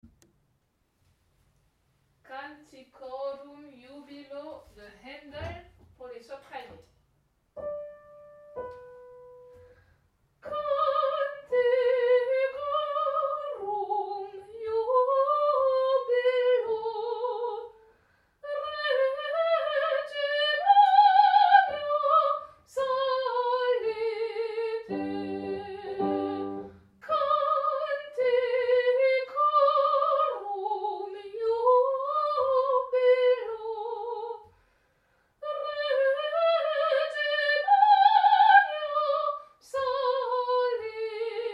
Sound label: canticorum jubilo sop